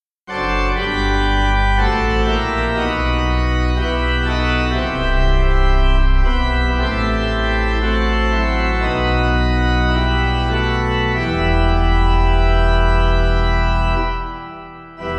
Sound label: Pipe